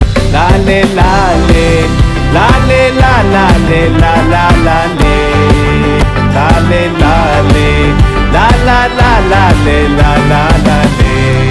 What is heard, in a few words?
Malayalam Humming